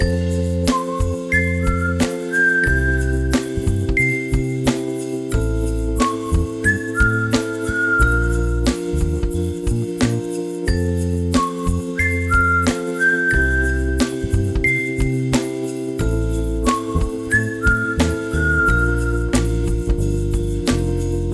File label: Happy Lazy Loop